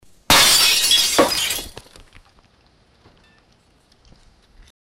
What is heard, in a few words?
wodden shelf being hit an, breaking